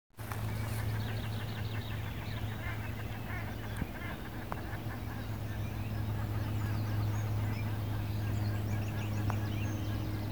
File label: Cockatiel, Nymphicus hollandicus; Aves; iSoundNat; inaturalist